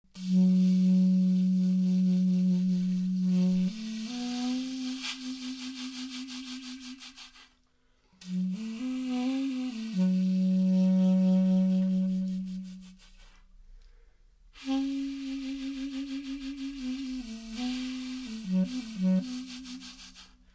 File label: Blues